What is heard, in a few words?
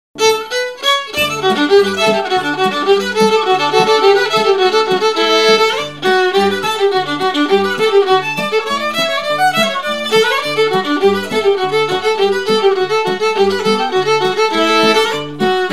fishers hornpipe